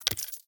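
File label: ui gold obtainment; Shelter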